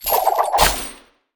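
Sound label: cowboy, attack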